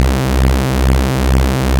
Wasp Saw Loop

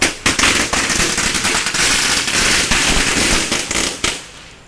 Firecrackers

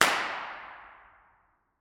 Ir Gunshot In A Chapel Mixed

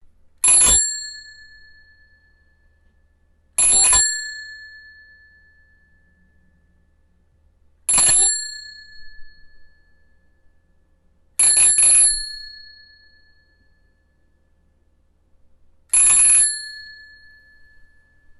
Bicycle Bell

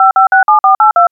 Dialing Phone Number

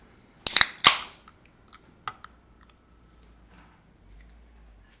Opening Can Of Beer; Abrindo Lata De Cerveja

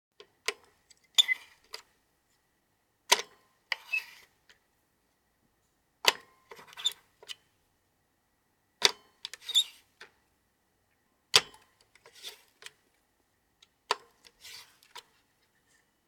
Screwdriver Tight

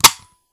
A Rifle Being Dry Fired Once

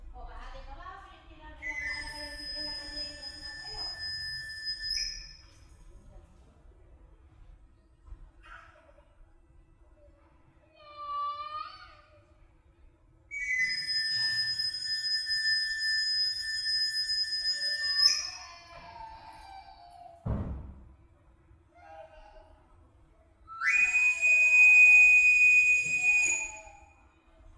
Lonely Street

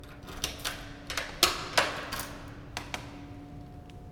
Door Basement

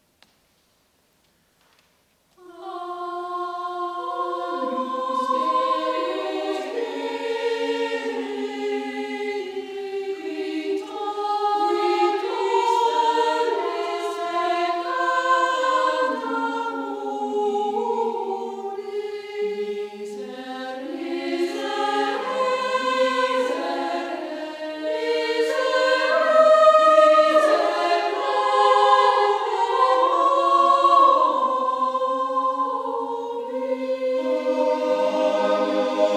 Choir In Church; Agnus Dei

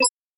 Button Click

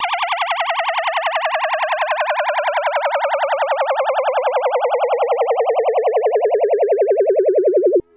Space Ship Lands

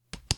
Card drop

